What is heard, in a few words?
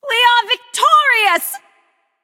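nonverbal yay; smite; voicepack; izanami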